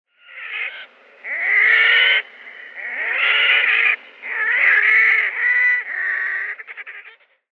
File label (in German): Rothalstaucher Podiceps grisegena